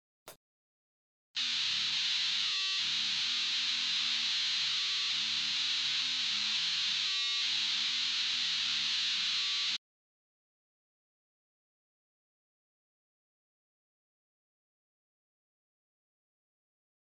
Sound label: intro vocal